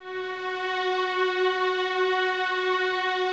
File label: VNS F#4, Violins; Miroslav Vitous; String Ensembles